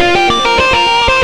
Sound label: xtra lead